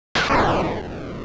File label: zoom; tfc